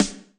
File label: snare, snare house